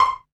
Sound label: PIZZ, Dance Mega Synth; Best Service; 90 s Sample CDs